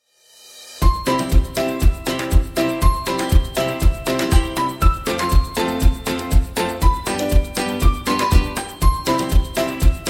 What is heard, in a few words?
Happy Childrens Positive